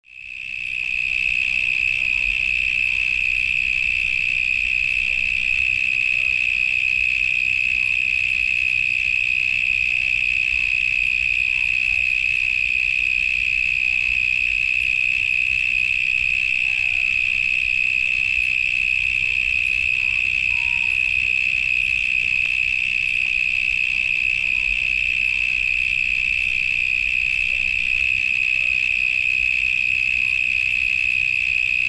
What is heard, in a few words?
CICADAS